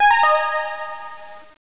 SYNTHY; psion cd vol1

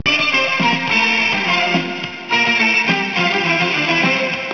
Oriental Song

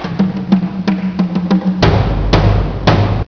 Drum Sound; psion cd vol1

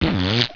FART

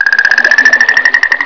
JUNGLE

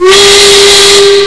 Train Pipe

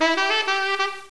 SAX; psion cd vol1

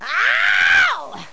"AOOWWW"

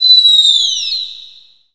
EAGLE; psion cd vol1

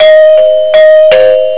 Door Bell 4x; psion cd vol1

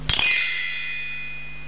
Magic Sound; psion cd vol1

